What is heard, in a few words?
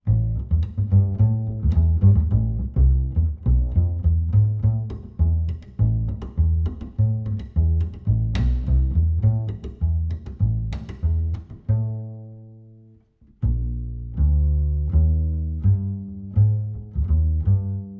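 acoustic bass Plucked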